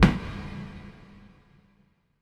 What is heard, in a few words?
SHORT, SHORTNOIS